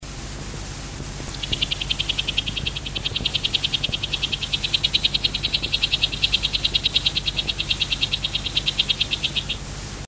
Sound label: Heterixalus madagascariensis